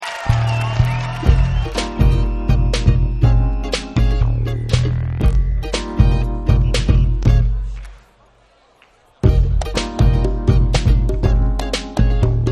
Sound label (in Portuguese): samba